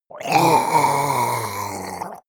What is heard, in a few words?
monster die gurgle; kreaturen